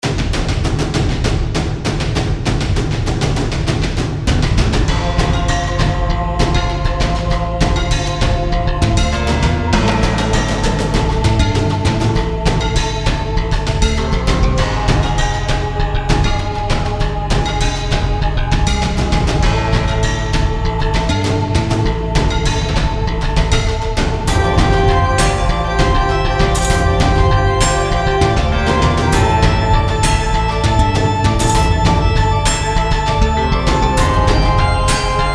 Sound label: Storm Drums